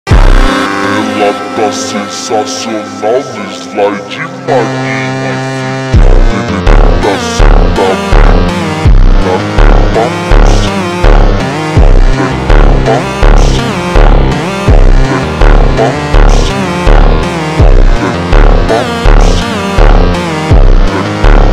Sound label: super slowed